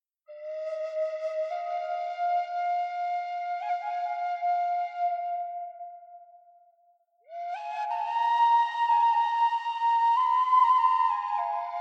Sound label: ancient flute